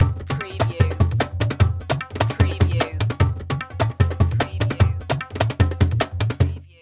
Musical

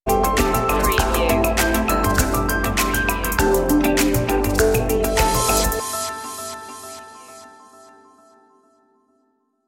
the quiet ocean stinger; media music now

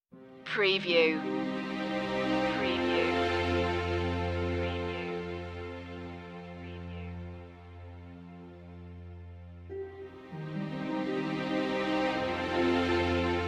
magic forest no choir

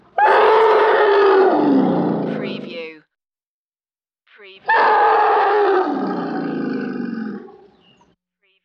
Elephant Trumpets Twice

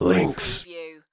links

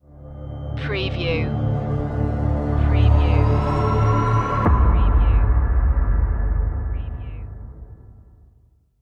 creepy sting

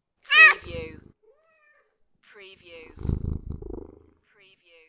Meow; beanstalk audio

